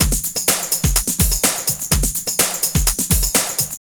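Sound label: drum loops, right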